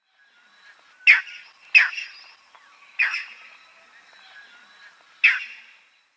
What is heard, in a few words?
Striated Heron flightcall